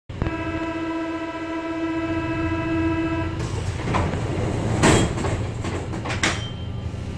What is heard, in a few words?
door